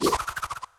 Boomerang; Link; Super Smash Bros

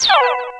Magic Ring Disappear

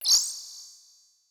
Pikachu - Speed Jump Begin; Super Smash Bros

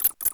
Swooper; Wii

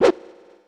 Moderate Sword Swish; Link; Super Smash Bros

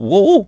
balancing; Mario; Super Mario Sunshine

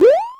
Jump; New Super Mario Bros. Wii

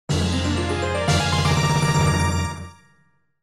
Heart Container Get; Legend of Zelda Ocarina of Time

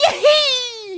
jump "yehee"; Mario; Super Mario Sunshine

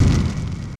Fire Burnt; Super Smash Bros

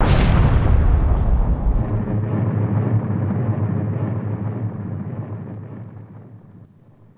Bowser Fall

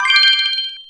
Key; New Super Mario Bros. Wii

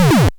Bobomb Cannon; New Super Mario Bros. Wii

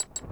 Screw Rotating

